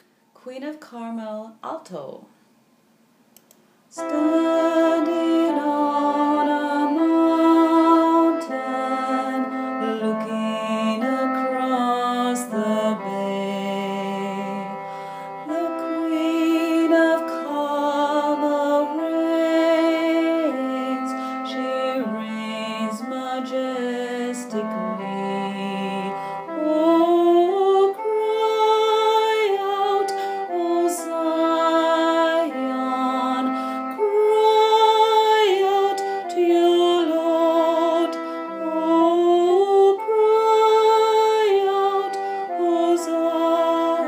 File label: alto, choral music